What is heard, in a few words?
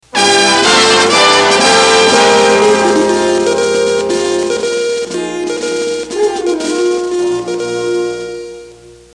news sounder; American Entertainment Network